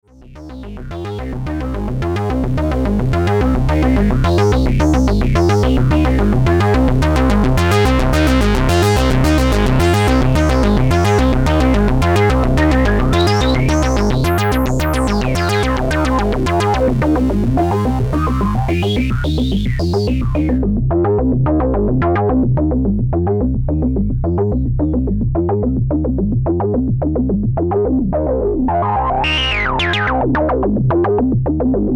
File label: Cwejman S1 Double Filter